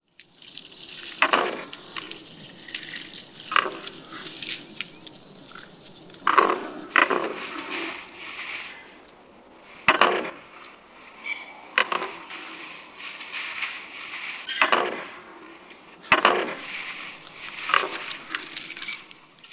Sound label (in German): Steinbock Capra ibex Hoernerknallen